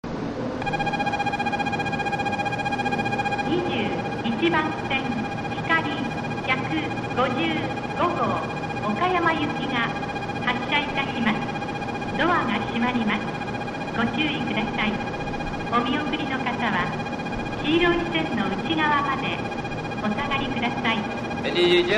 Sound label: shin-osaka bell; tokaidos; jrcentral